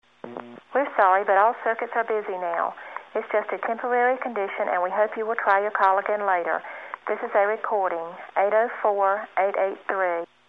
circ busy, telephone sounds, modern north american telephone sounds; various virginia telephone recordings